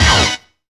TEARIN STAB; Zero-G; Funky Elements

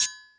FX Sounds, Drum Machines E-MU Pro-Cussion

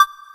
TECHNO PEGG; Pro-Rec - Synthsonic; TRINITY

